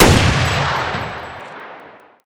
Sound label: gun; mcheli